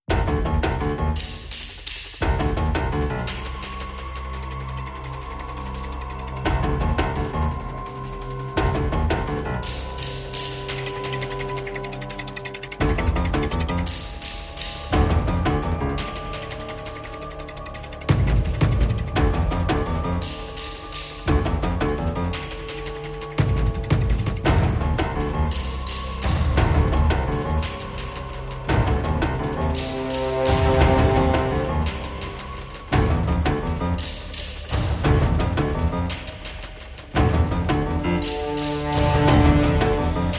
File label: no strings